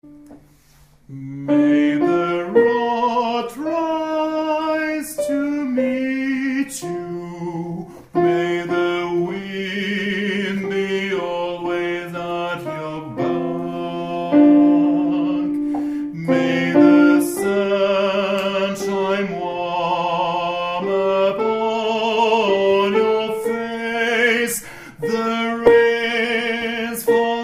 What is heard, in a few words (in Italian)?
Soprani